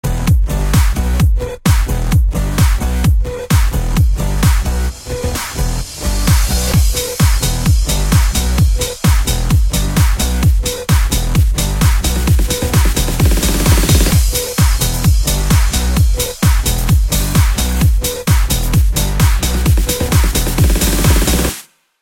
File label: song; vex3